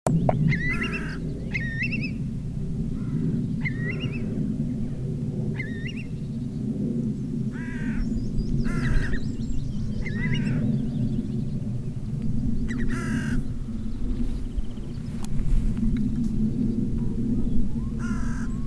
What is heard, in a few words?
curlew